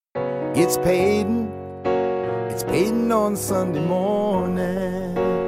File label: RNB